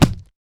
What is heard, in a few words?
taunt yeti chest hit; Soldier taunts